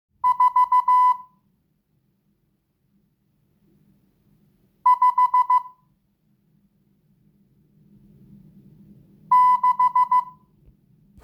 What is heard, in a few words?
morse; qrb